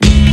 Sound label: Blended Brass; Mo' Phatt; Digital Sound Factory Mo' Phatt